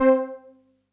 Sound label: 505, String Machines